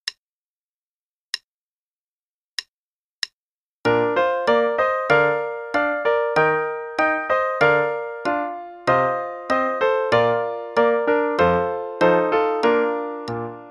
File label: Play-a-long, both parts, qn=96, trumpet; Belwin; Burlesque